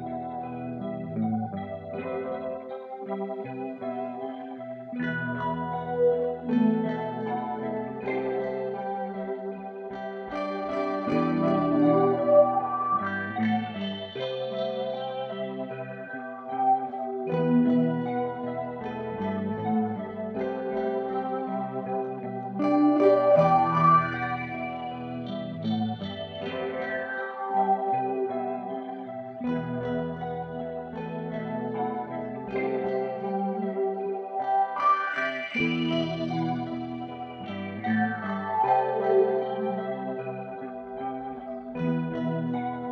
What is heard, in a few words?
tremol; Smoking kills